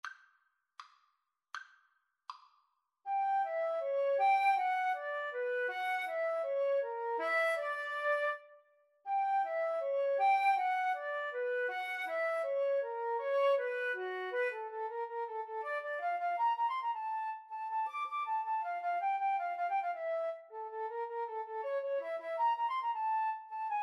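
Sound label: flute violin karaoke; Violin-Flute Duet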